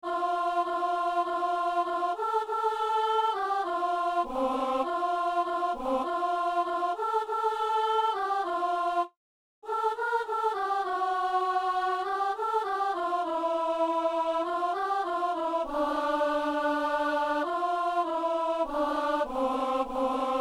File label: alto; Les anges dans nos campagnes